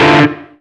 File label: Synth Sound; Vengeance Samples; VEE Synth Shots